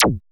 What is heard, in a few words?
ZAP ME; HIQ+ZAPS